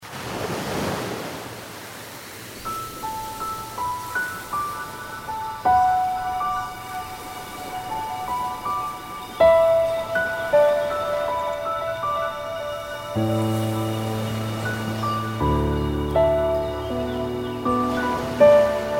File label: Tsunami